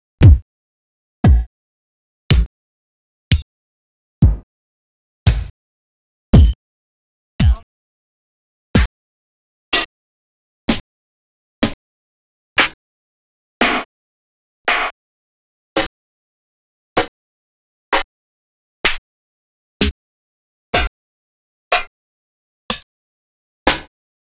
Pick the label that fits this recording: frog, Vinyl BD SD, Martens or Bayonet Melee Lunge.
Vinyl BD SD